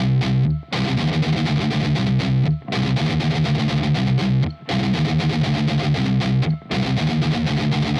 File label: loop c 120bpm